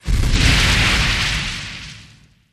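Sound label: Flame Burst Slow Attack, flamethrower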